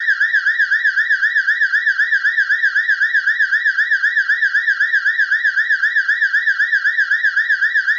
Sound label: Intruder Alarm siren; cuffley hall